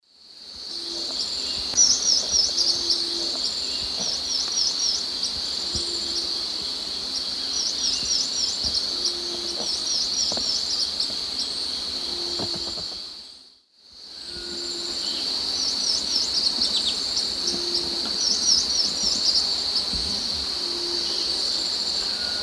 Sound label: Phaethornis bourcieri, song at lek; S. America; Eastern Lowlands